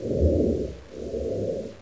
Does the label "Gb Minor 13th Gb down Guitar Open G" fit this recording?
No